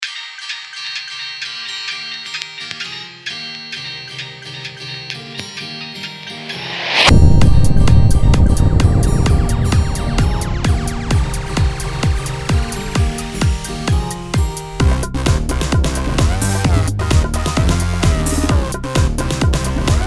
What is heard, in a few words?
Bongo Master